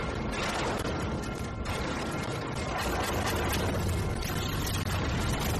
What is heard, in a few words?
shock; serial